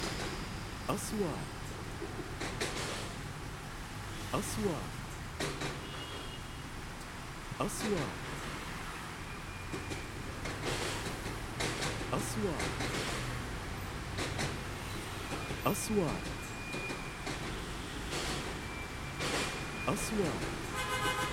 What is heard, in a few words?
Cars Road under the reform